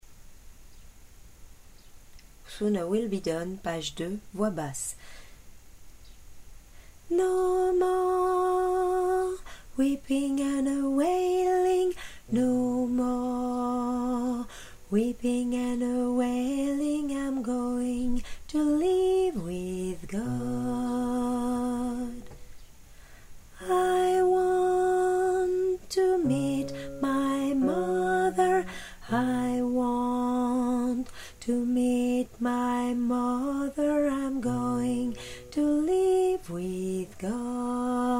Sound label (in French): basse